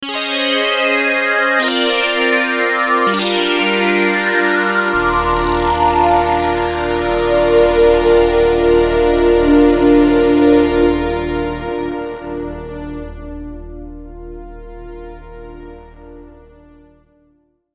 Fiiilters, Prophet '08

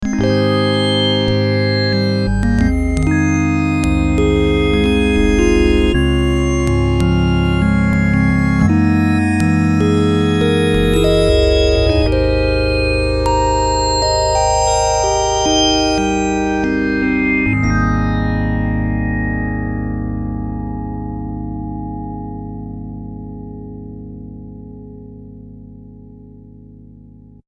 Prophet '08 E Piano

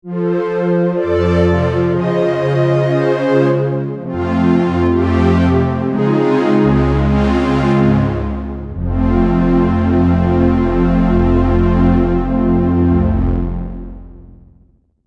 Dark Strings, Prophet '08

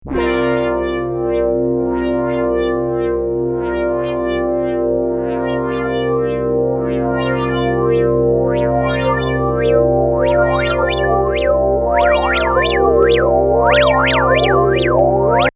Tricky Sweeper, Prophet '08

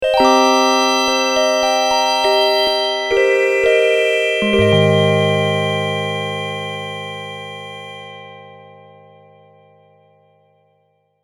Clean Glass Bell, Prophet '08

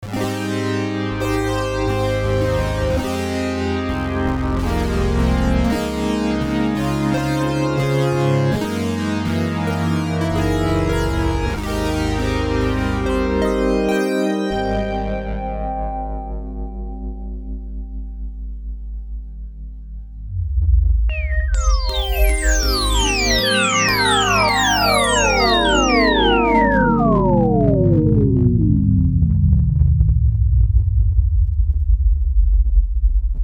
Analog Wurly Roids, Prophet '08

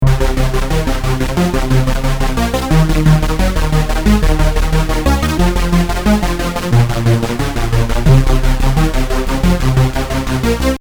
Hoover Rave, Prophet '08

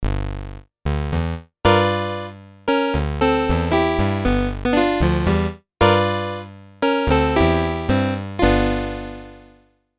Cheap Polysynth

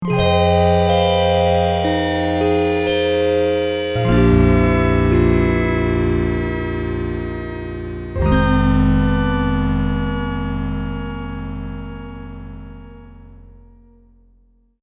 Falling Bell, Prophet '08

